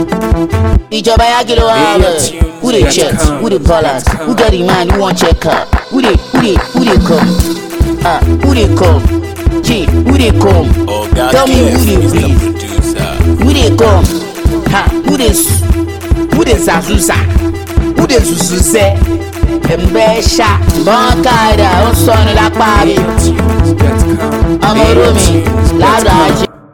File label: cruise beat